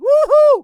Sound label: "wuhuu", Voices; Trafalgar; Quarry